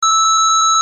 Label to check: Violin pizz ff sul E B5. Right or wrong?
Wrong